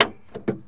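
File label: Truck Cherokee Hood Open; SFX Kit; Trucks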